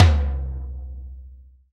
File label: TOM TOM, Real